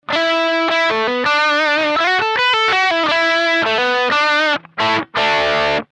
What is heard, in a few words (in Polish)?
Crunch, gitara